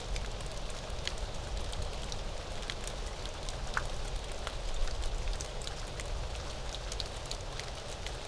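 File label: rain splatter platform loop; Star Wars Obi Wan; XBOX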